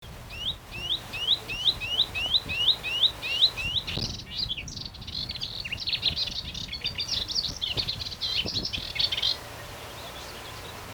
Melodious Warbler

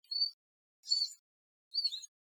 calls, tristis; Coton2015

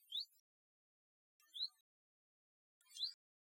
huit calls, tristis; Coton2014

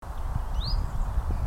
huit single

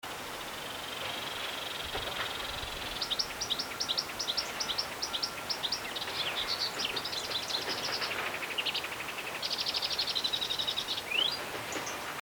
Melodious Warbler

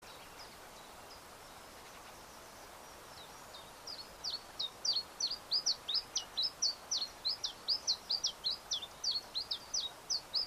Full sequence, tristis; Coton2014

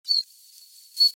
collybita juv v tristis; Warks2013

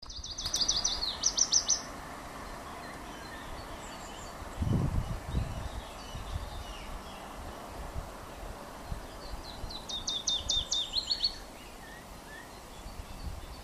chiff combo

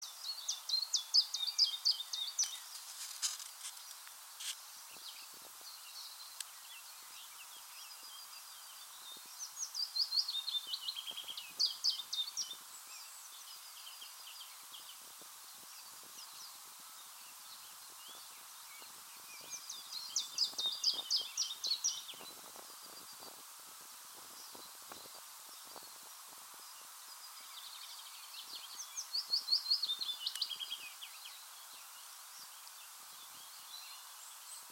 chiff combo

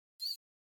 sweeoo call, tristis; Coton2014

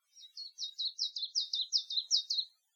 Fast C C like

